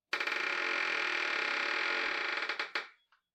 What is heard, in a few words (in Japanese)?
ドアがきしむ音; door